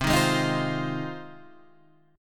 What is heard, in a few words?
Ab 6th C